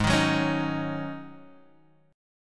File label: G sharp 7th Suspended 4th G sharp, Guitar Half Step Down